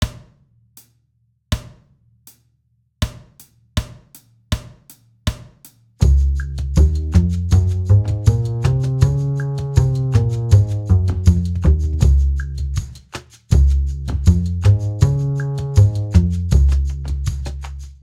Scales Concert Db

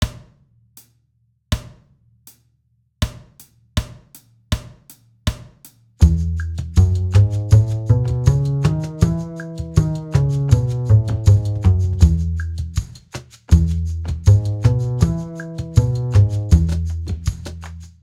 Scales Concert E

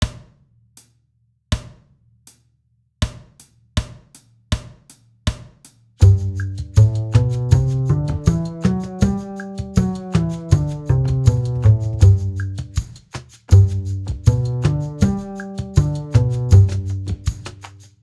Scales Concert G

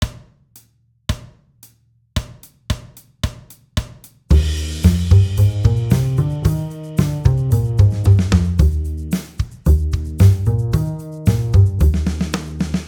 Scales Concert Eb

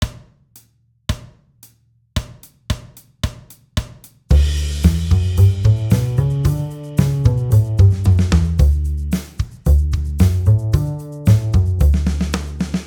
Scales Concert D

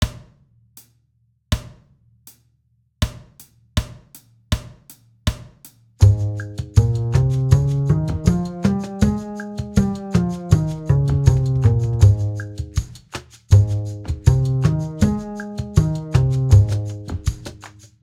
Scales Concert Ab

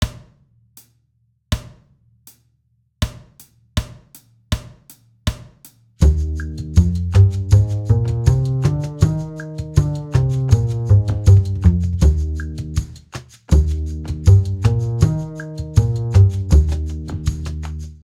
Scales Concert Eb

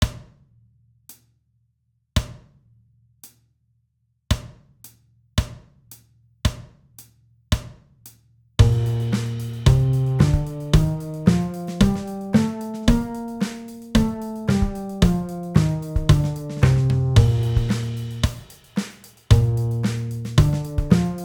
Scales Concert Bb

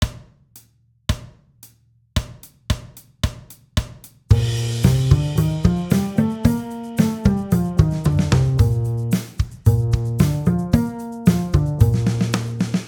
Scales Concert Bb

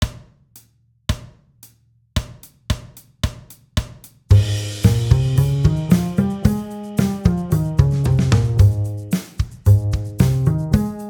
Scales Concert Ab

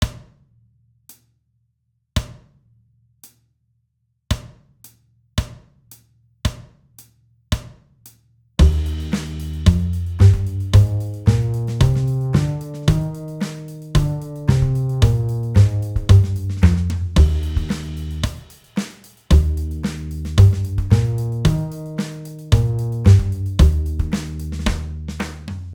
Scales Concert Eb

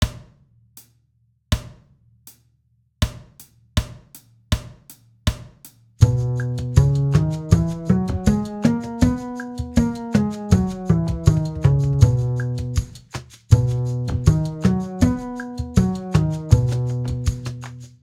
Scales Concert B

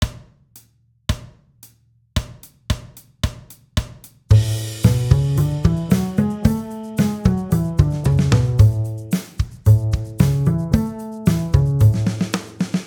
Scales Concert A

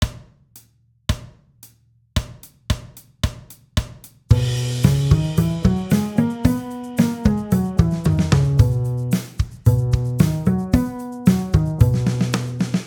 Scales Concert B

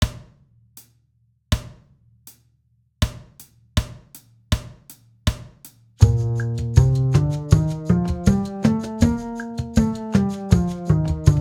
Scales Concert Bb

